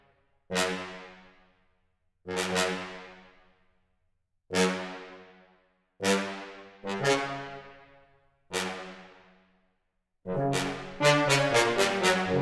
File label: Staccato Trombones